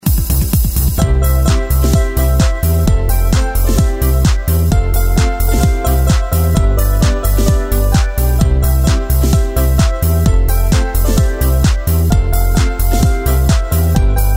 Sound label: jingle bells remix